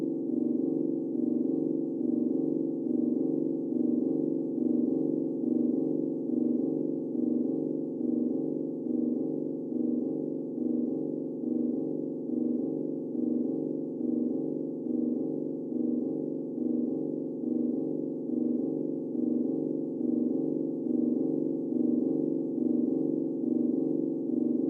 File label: Endboss Little drum